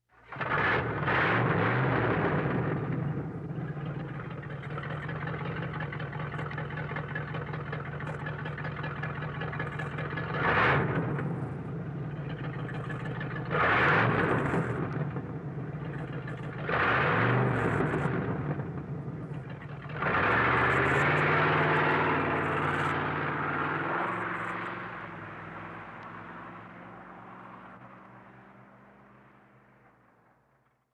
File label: Car Chase; Stereofect